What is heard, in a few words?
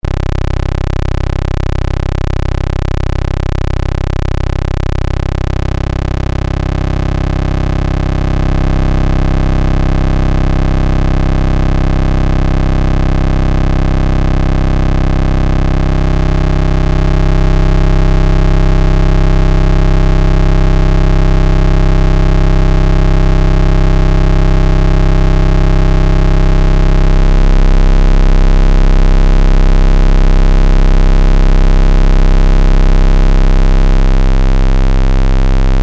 Pulse 2 Oscs 1 2 3 2 1